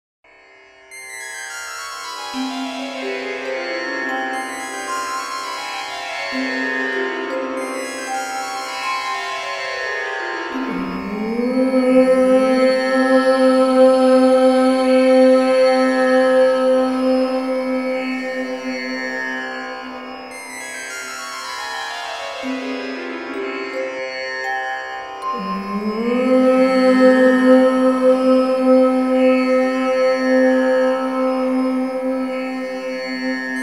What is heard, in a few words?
Om Dhavni Female